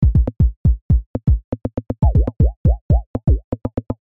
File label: drums